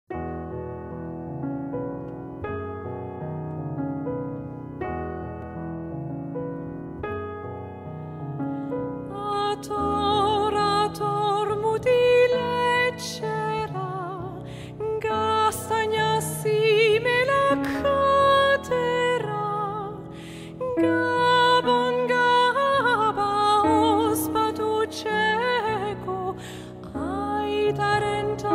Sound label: Villancico Vasco soprano; Joaquin Nin Y Castellanos; piano